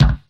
old school clear new wave bass drum one shot c sharp key; kick